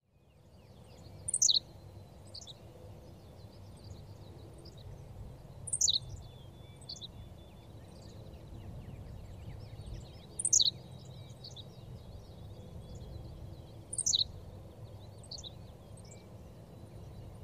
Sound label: Henslows Sparrow Ammodramus henslowii; sparrows